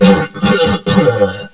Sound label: DIE; helmet